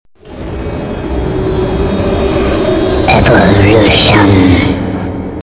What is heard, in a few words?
zombie evolution female